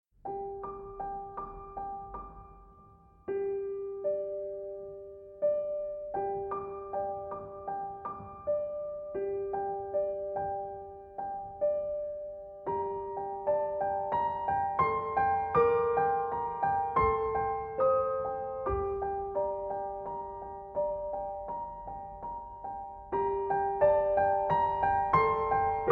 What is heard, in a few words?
Piano Suite; Chocolat